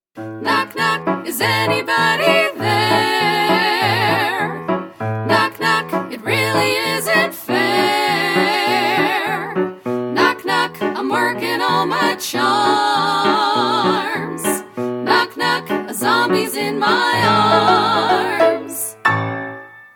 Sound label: Vintage Broadway TRIO